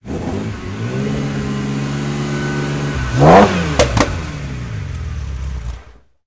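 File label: Ferrari Testarossa Rev Off, Automobiles; SFX Kit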